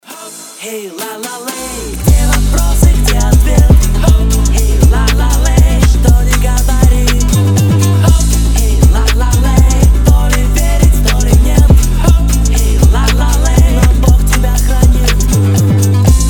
phonk remix

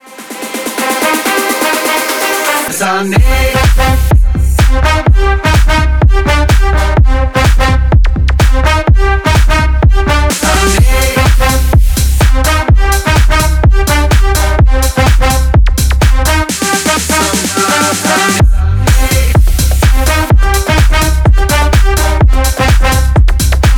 samba

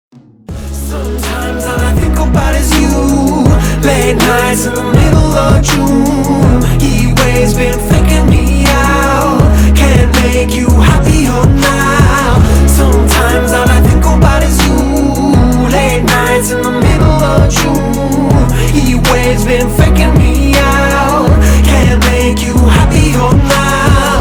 lofi

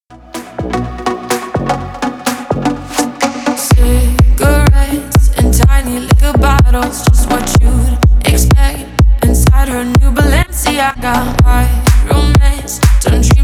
loop echo

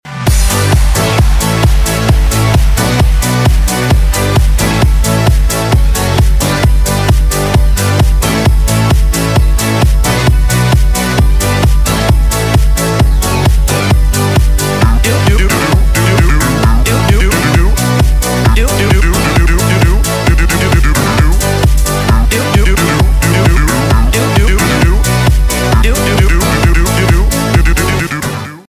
rap ringtone

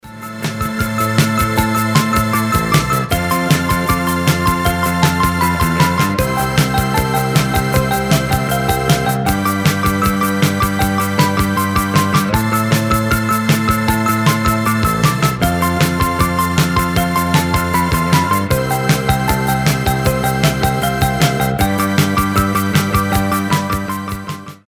electricity

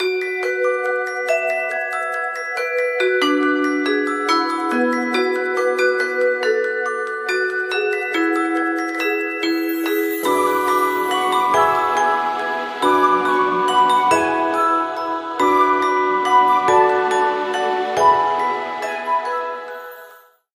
magical fantasy

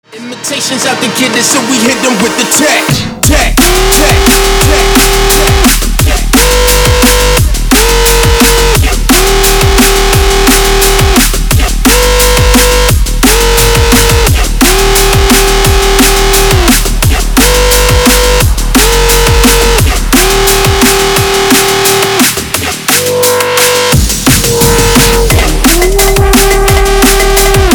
siren

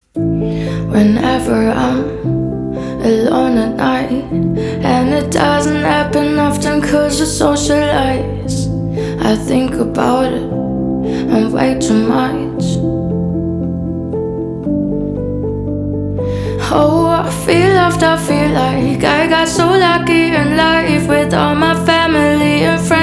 acoustic version

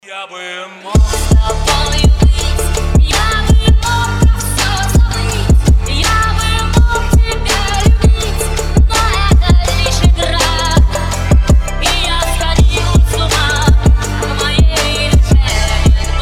phonk remix

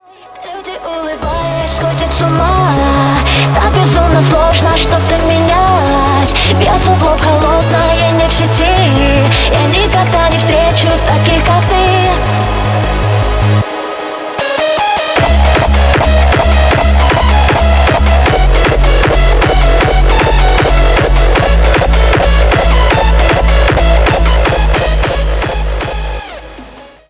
hardstyle remix

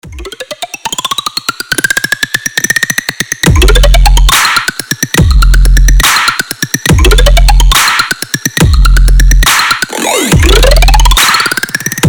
roll drop

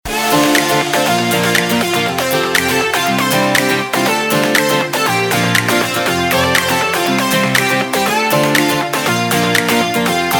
goa ringtone

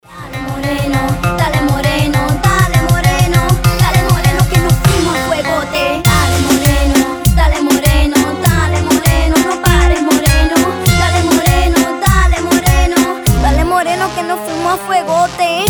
reggaeton remix

